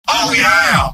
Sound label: scout cheers; mvm